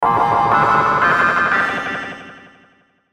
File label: Musical Hits, Musical Hits Resonant Synth Cymbal Delay; Miscellaneous Musical; Blastwave